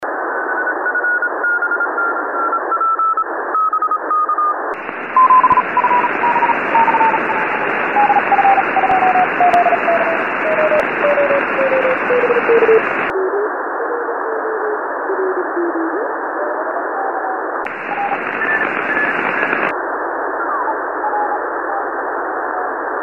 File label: iss bounce